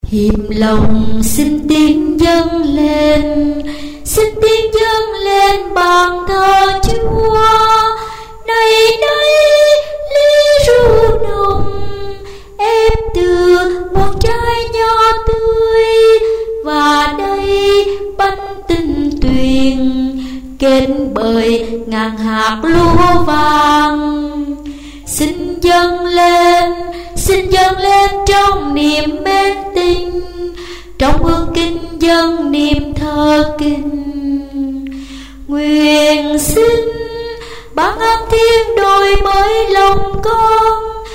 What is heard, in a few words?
tenor basso